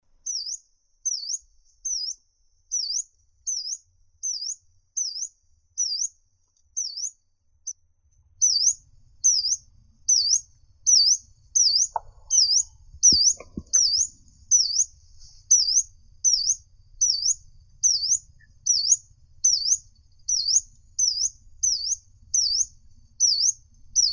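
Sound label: yellow-browed warbler, Phylloscopus inornatus; penochka zarnichka; zvuki prirodi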